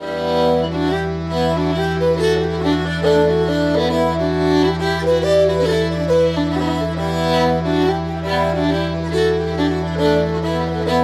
Considine's Grove Reel

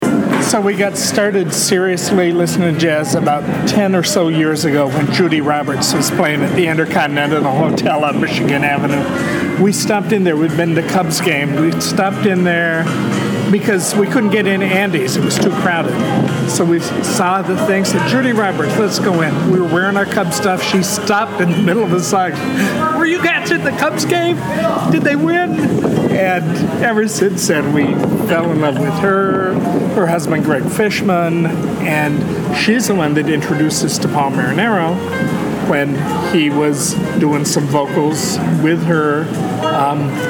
Jazz Couple; Columbus Park - June 10, 2016; 2016 JazzCity

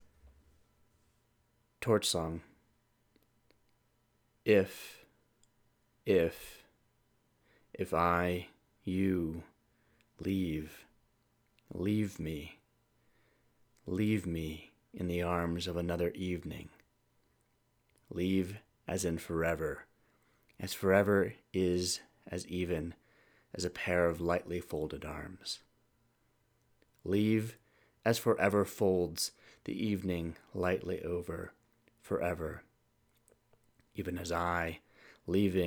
Torch Song

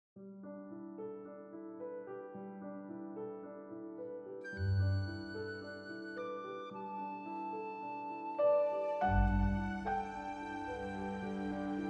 Romantic Comedy Dreamlike

